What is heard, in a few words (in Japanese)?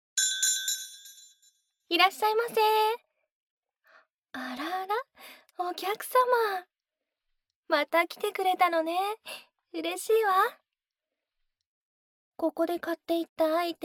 全身フェラ, ASMR; ミニマム魔法で全身フェラ